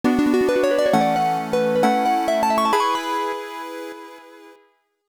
Harp; Brandish VT; hoot; PC-98; Sonata

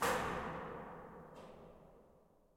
Door Chrono Activation

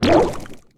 Jello destroy

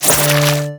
Boss Attack

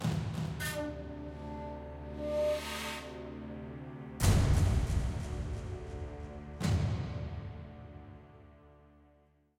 Stinger; assassin s creed chronicles china; E01 Macau Port; Bridge

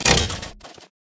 Mechanicals smaller; Voices of the Void

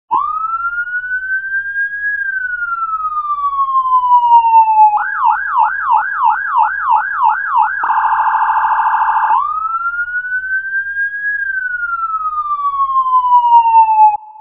Alarm; Cruelty Squad

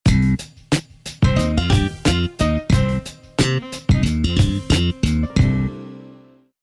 Defeat; The Onion Knights; End Event

